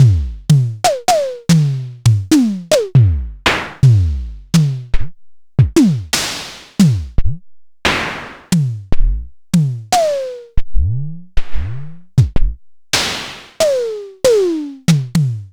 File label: Tama TS-206, Samples Sliced; m8